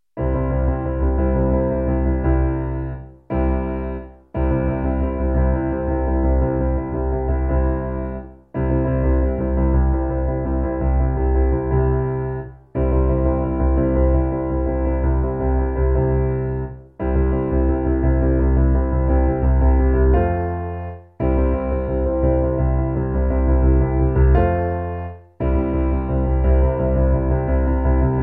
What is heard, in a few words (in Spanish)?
PIANO